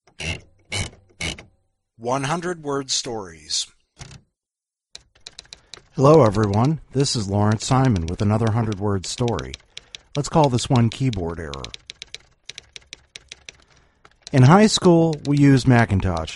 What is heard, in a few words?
keyboard error